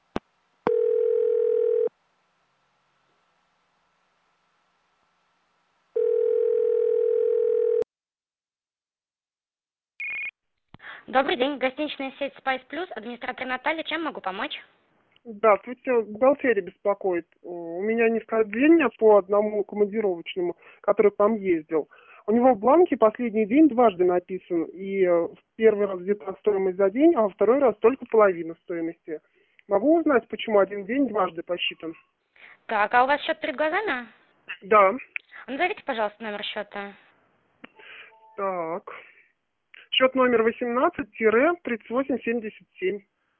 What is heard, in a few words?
telephone call